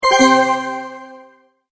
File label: multimedia tone, Goodbye